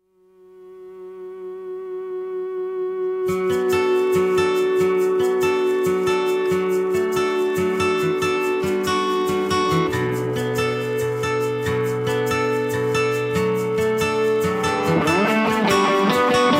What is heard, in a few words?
ringtone